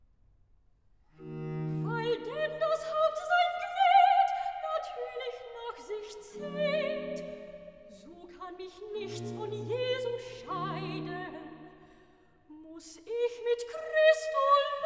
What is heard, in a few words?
Cantata, Recitativo (Sopran); BWV 31 'Der Himmel lacht! Die Erde jubilieret'; Bach; Eisenach; Easter Sun & Mon